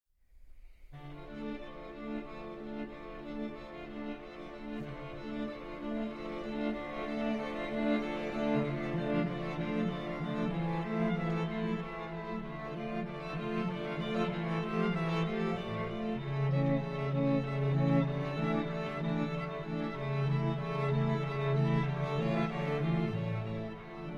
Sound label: String Quartet; I. 1957